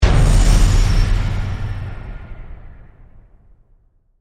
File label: Hit, Deep, Swirls, Reverb; Imaging Element; Accent; Transition; Production Element; BlastWave